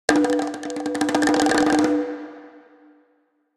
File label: near win bongos; rise of maya